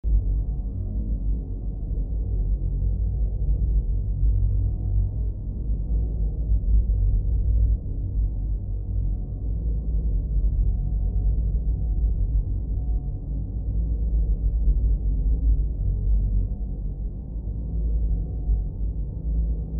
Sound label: entrance, bunker; alternativa demos